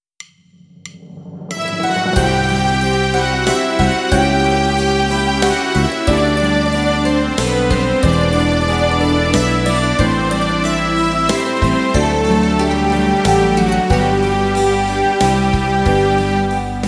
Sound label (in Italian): valzer lento, base